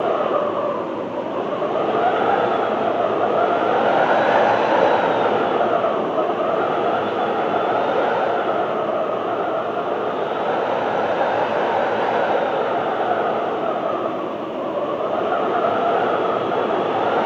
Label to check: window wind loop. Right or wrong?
Right